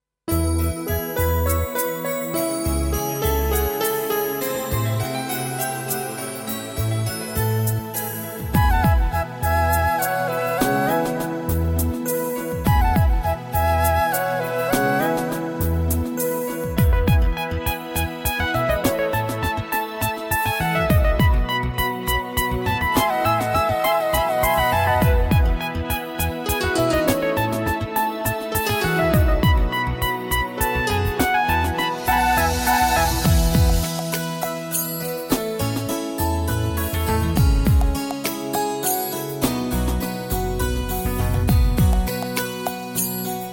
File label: X'an Devotional Karaoke